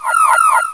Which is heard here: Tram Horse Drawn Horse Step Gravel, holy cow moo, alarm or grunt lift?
alarm